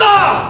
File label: soft hitwhistle; osu!